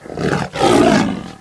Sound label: bear alert